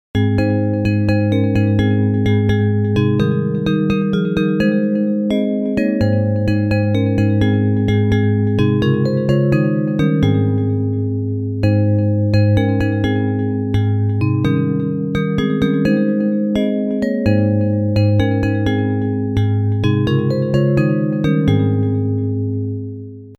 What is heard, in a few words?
Bells